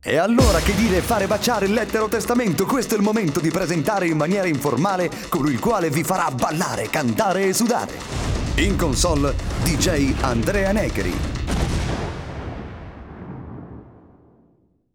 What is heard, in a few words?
jingle